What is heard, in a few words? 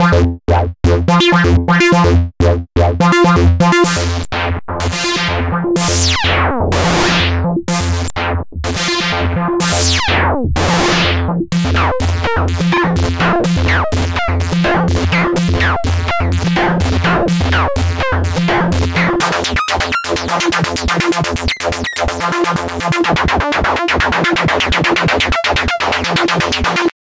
Analog Bassline; Malfunction